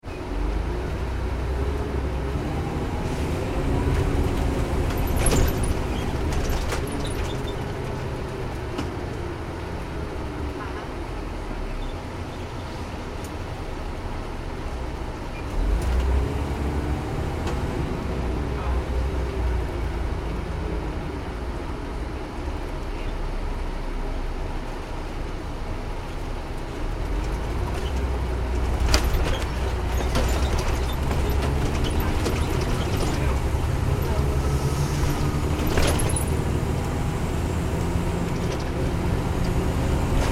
transportation bus driving nyc internal, Bus Driving In City (Internal); Bus Driving In City ( Nyc ) - Internal Recording; Busses; Vehicles